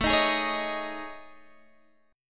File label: A Major 7th Flat 5th, Guitar, Open G; chord